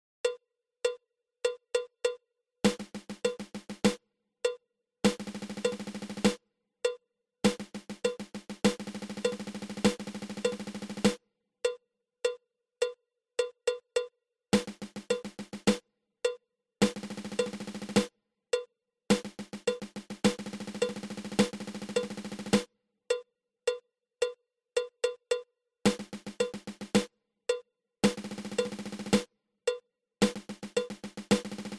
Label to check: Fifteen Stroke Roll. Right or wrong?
Right